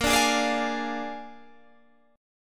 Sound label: A sharp 7th A sharp, Guitar, Open G